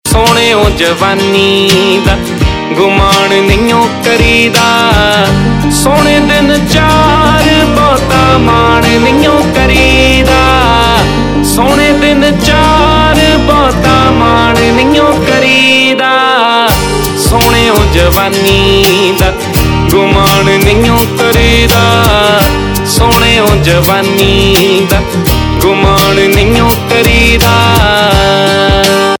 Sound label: Ringtone, Punjabi